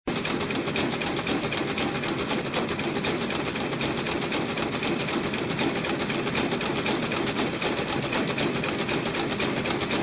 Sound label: Factory Noise Long